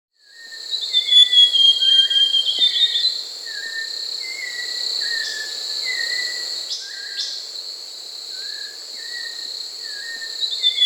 Blue-black Grosbeak (Cyanocompsa cyanoides), Peru; S. America

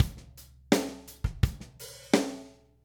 Drums, Rock

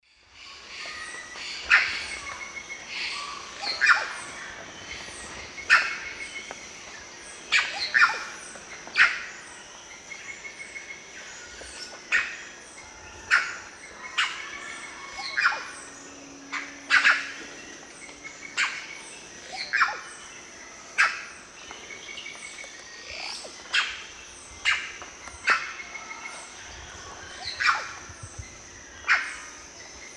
Cacicus haemorrhous, calls and songs group; S. America; Eastern Lowlands

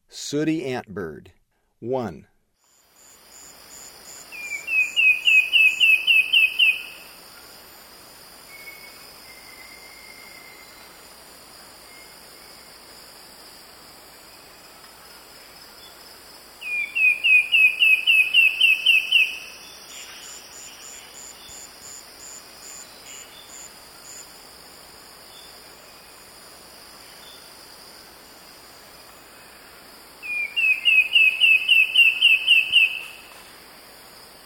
Sooty Antbird; S. America; Eastern Lowlands

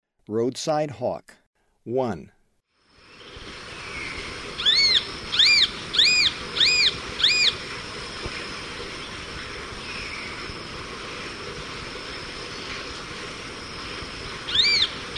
Roadside Hawk, Birds of Ecuador; S. America

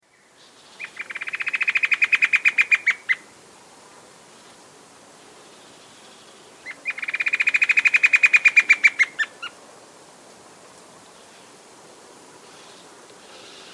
Black-Capped Antwren, Nature's Songs, S. America

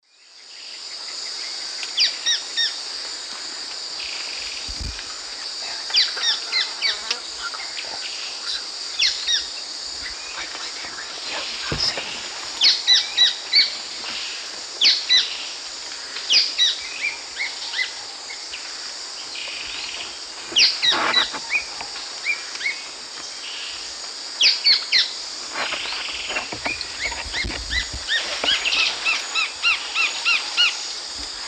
Myrmotherula axillaris, call and song; S. America; Eastern Lowlands

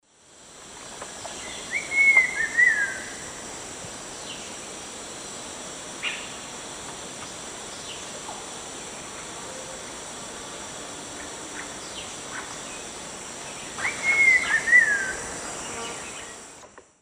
Saltator grossus, song; S. America; Eastern Lowlands

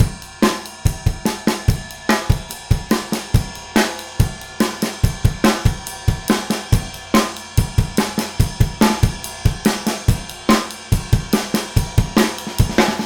ALT ROCK DRUMS

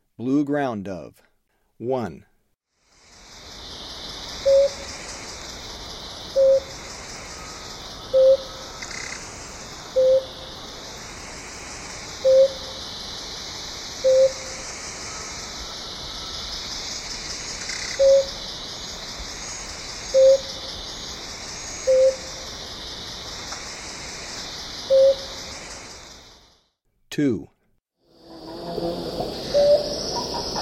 Blue Ground-Dove; S. America; Eastern Lowlands

